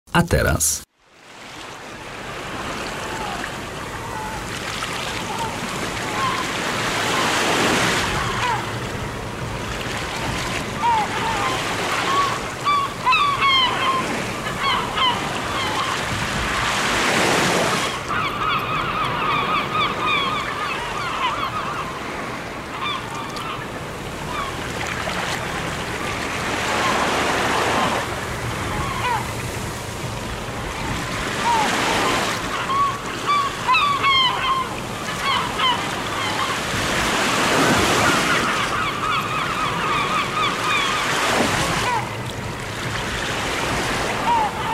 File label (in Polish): morze odgłosy; od gor do morza